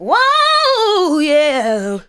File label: ADLIBS 90; Ueberschall; Jam Box